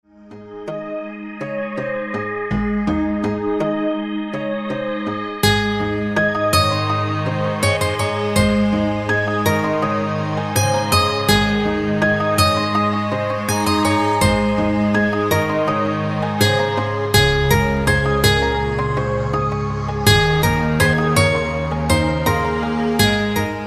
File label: hawaiian guitar